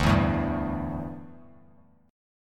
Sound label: D Suspended 2nd Suspended 4th D down Bass Standard; strummed